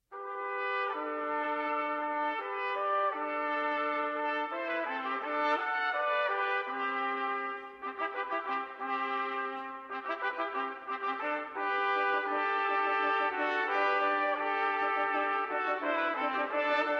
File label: Fanfare